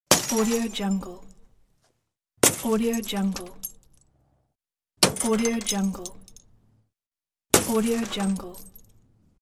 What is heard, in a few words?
smash the car headlight